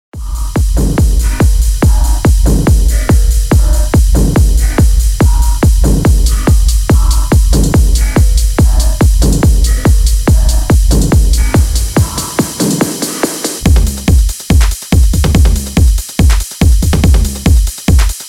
Techno Sample Pack Underground, techno underground sample pack; PML; production music live